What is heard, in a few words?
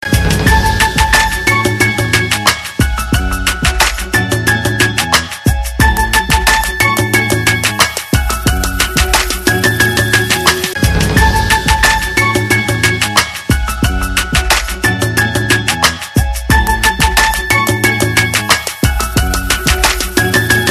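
Kick instrumental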